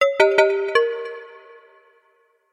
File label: wood